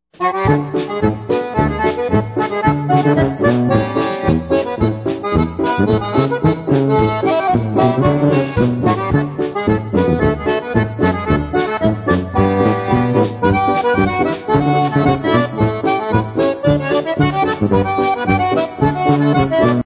concertina

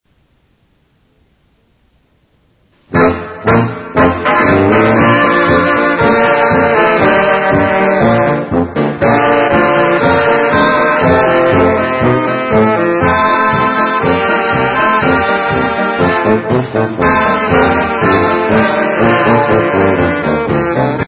Broken hearted polka

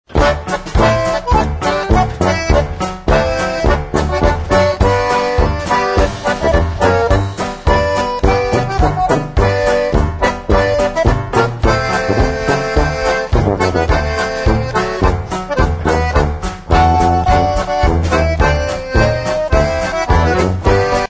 CONCERTINA

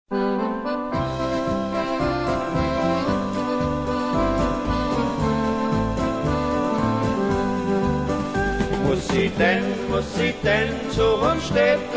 POLKA

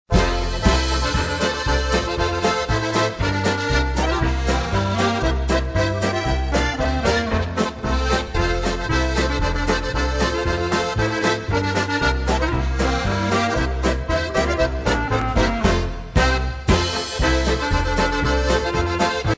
polka

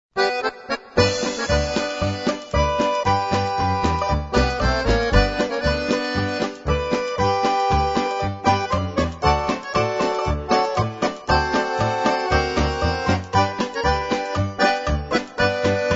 HAWAIIAN POLKA

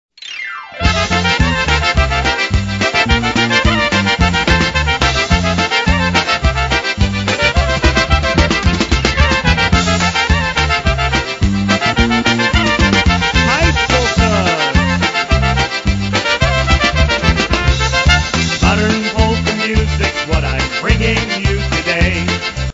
ENGLISH VOCAL

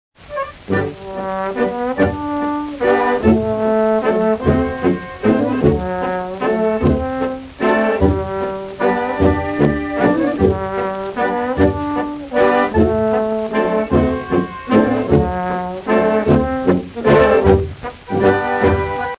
tyrolian waltz

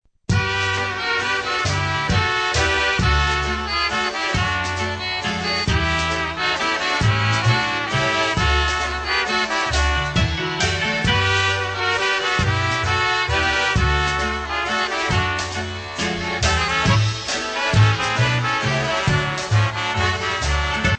WALTZ

